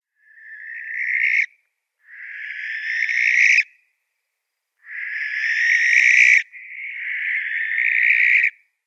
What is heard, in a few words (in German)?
Schleiereule, Eulen